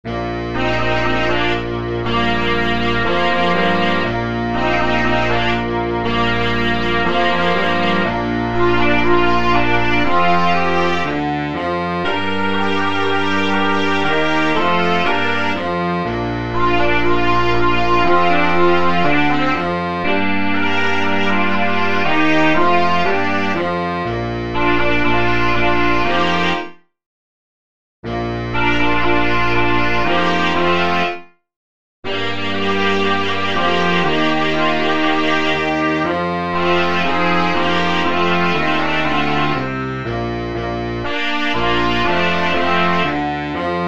sans flute

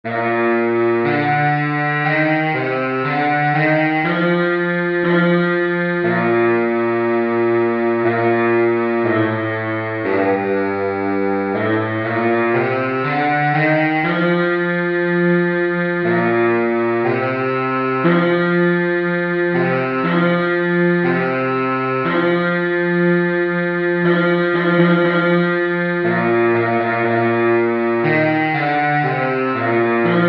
o canada bari sax

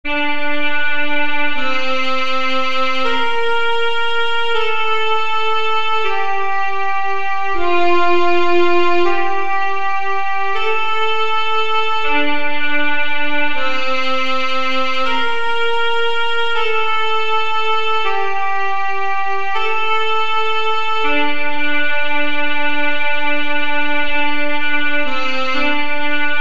alto sax

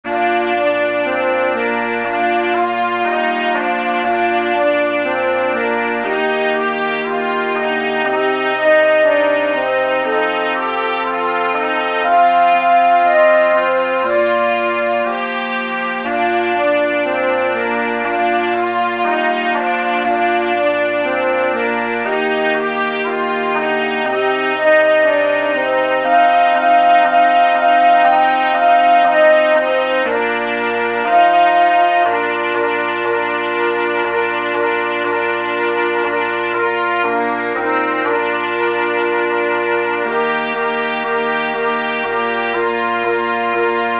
jingle bells sans alto sax

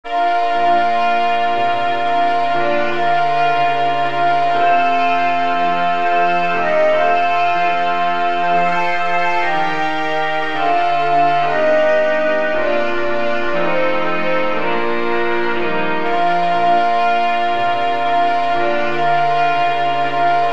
sans bass guitar

